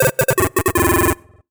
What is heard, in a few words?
MUSIC EFFECT Solo Chip Square Negative; startide macos switch windows gamerip 2017